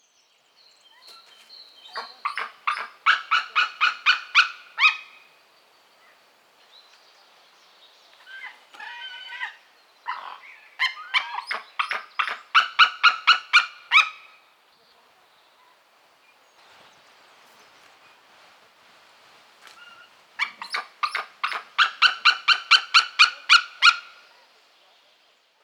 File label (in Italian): aquila di mare; Haliaeetus albicilla; White-tailed Eagle; Accipitriformes; Accipitridae; call; cattività; aquila di mare con mestoloni